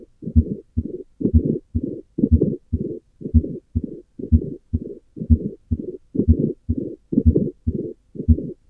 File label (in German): Perikardreiben